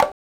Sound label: high toms; sgi